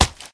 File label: mud step; Golden Crayon Sounds